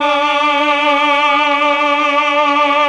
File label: CHOR, Propeller Island Legendary M400; ILIO